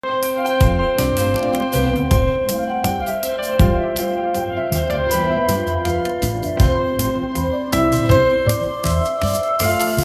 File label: calm moon